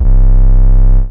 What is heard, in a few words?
Sticky Dist 808